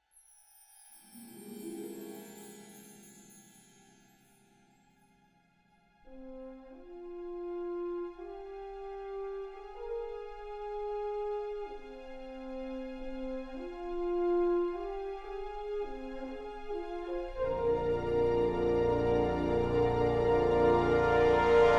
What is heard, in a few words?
Trailer Music; Star Wars VII